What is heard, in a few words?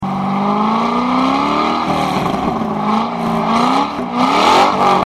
05gto sport exterior revs, exhaust systems; corsa